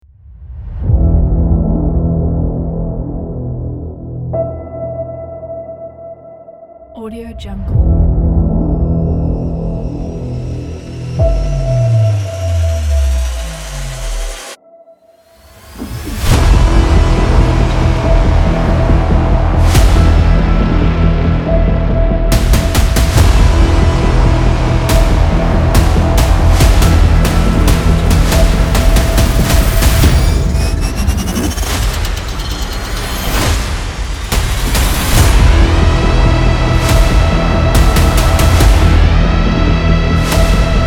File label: action trailer teaser